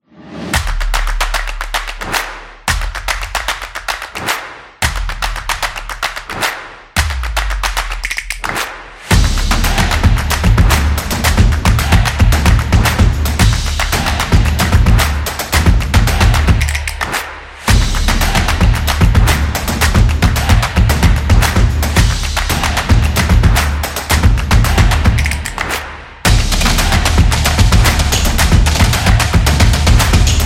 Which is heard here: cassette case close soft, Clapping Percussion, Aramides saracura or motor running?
Clapping Percussion